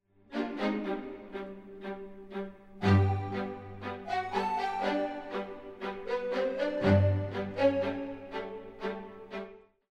round ten sec count

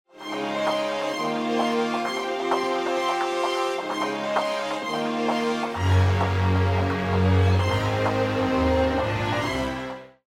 bomb ten sec count; music kits custom

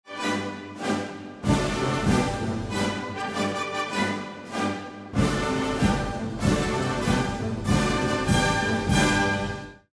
bomb ten sec count; music kits custom

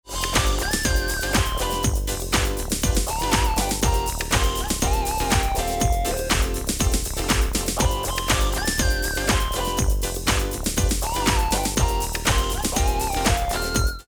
won round; music kits custom

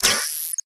Sniper Rifle Zoom; weapons; Requests Studio; UT3

